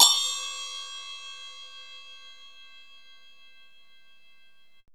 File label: HAND CYMBAL; PERCUSSION; AMG - Vince Clarke Lucky Bastard